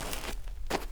dirt shuffle, footsteps; chief

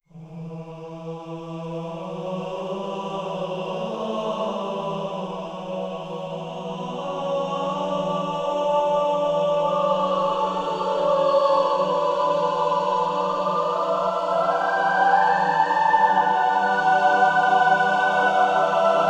vox

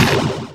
crush; Nintendo

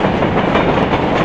train; Nintendo; sf64

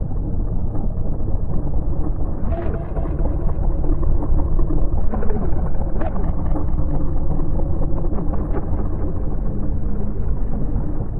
alt loop, spooky

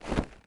jump, footsteps dirt; jump up; elite

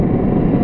rocket; Nintendo; sf64

